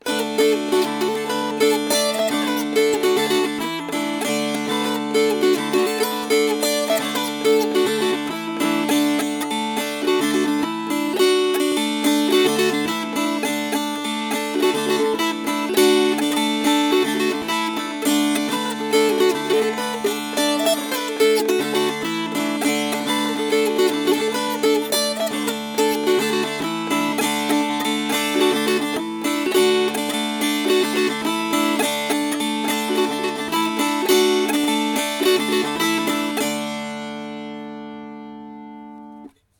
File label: Fast